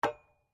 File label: SLEDGEHAMMER METAL IMPACT LIGHT; Working with a sledgehammer; Hammers; Tools